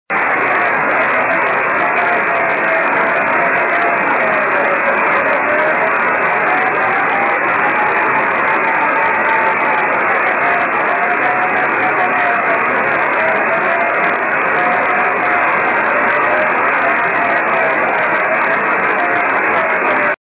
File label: 24G beacon; 雨、気温8℃ 湿度80％; ANT: 60cm dish 受信：ＤＤ対応機 SSB:RST405 レインスキャッタ; JH1UGF 横浜市 → →; JN1AYV 茅ヶ崎市 → →